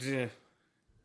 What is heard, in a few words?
sigh